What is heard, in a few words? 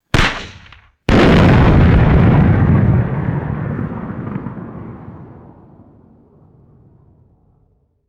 loud outdoor cannon explosion with long decay; explosions